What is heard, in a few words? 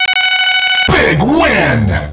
Green Beep Big Win